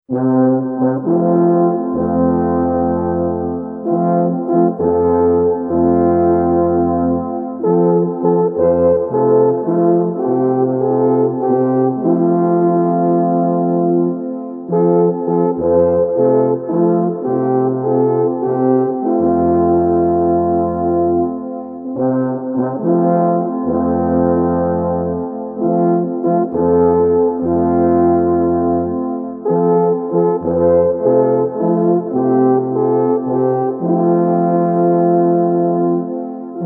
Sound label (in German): Alphorn; Kaltentaler